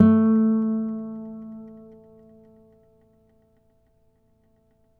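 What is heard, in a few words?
nylon, FLAMENCO, Guitars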